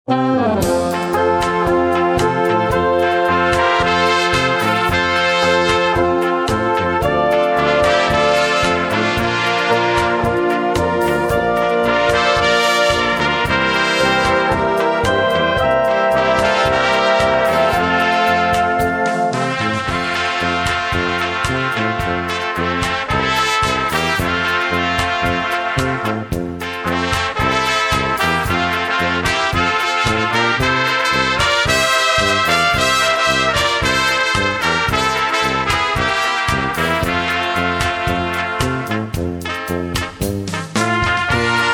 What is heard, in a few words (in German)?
Trompeten Rumba